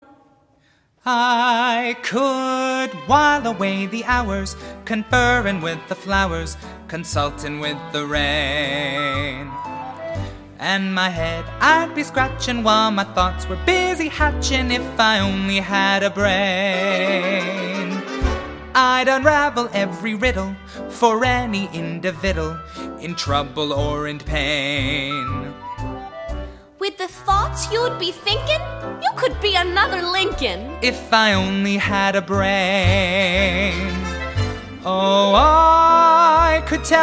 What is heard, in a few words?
vocals